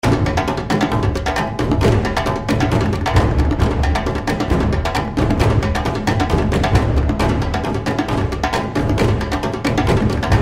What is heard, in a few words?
music; Stampede Call of the Pride; Eyecon